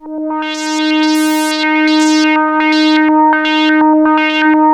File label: ARP 2600, Vintage Keyboards